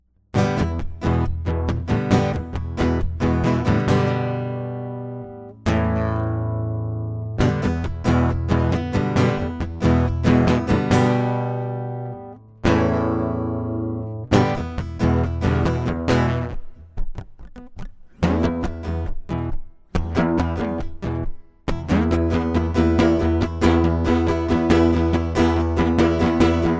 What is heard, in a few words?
acoustic; Consider the Taste